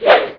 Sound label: Warrior Blade Swing; Playable Tutorial Level; TR2